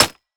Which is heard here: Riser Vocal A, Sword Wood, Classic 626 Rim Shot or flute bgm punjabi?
Sword Wood